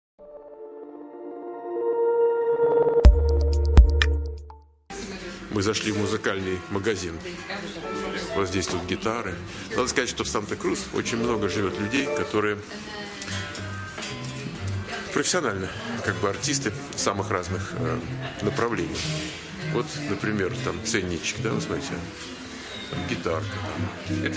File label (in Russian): gitara merikanskaya